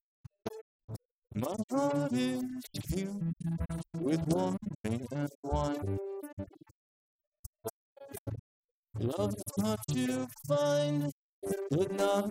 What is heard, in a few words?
vocals; SiSEC11